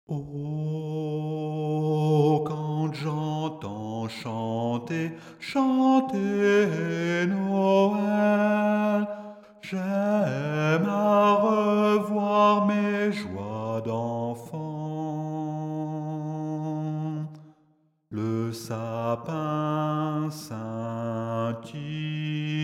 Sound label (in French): noel basse